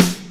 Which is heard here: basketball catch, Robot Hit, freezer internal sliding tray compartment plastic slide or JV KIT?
JV KIT